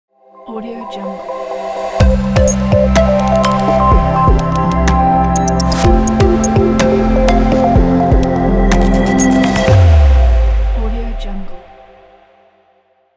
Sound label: glitch logo